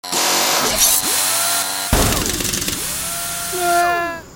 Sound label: Drill